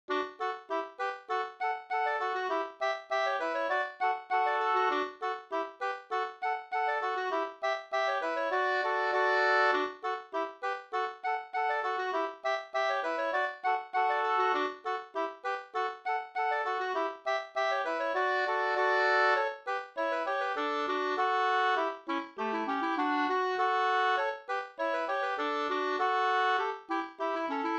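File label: polka polkett D major; fr Brunn 2st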